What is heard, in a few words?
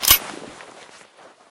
dual pistol slide back; Left 4 Dead; Source Engine